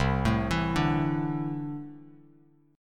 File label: C 6th Add 9th C down Bass Standard; C6add9 arpeggiated